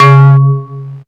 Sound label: BELL BASS, Hard & Loud Techno; USB Soundscan vol.01; AKAI